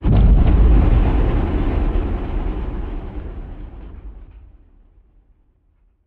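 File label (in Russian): глухой дальний звук взрыва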